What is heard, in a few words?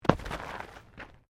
Body movement Impact Roll Gravel